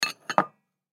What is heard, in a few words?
Tea Cup Saucer, Tea Cup Saucer Set Down Hard Wood Table Antique; Dishware; Household; Blastwave